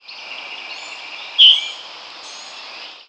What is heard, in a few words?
Wood Thrush; Thrush-like calls; thrushes